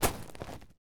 footsteps gravel

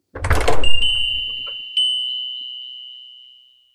the doorbell rang; lifestyle

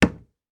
footsteps wooden floor; 足音

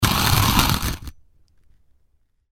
Grind the coffee beans out

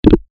move

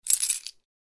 coin sound; game inn

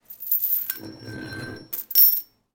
Footsteps Chain

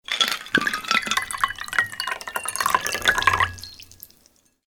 Pour the cocktail into a glass

